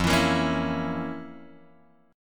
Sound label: F Major 7th Suspended 4th Sharp 5th; FM7sus4#5 Chord {1 X 2 3 2 0} strummed